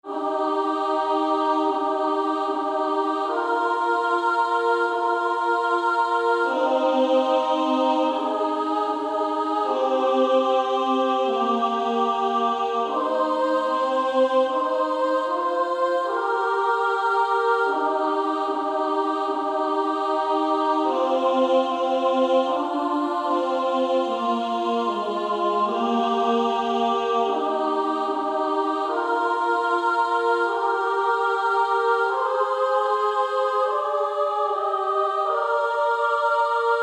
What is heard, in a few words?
Female Choir SA